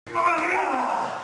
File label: hurt heavy; billy